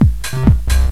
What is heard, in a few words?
house b, drum; recreational research foundation